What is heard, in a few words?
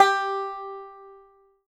banjo G4, banjo notes, country; Sampleheads; Whole Lotta Country 1 & 2